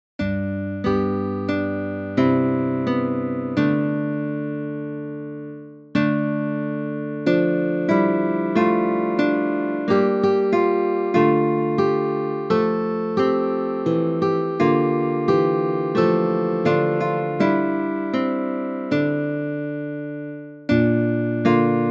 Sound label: guitar AgnusDei; Hymnal 1982